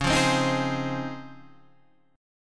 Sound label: C sharp Major 9th, Guitar, Half Step Down; C#M9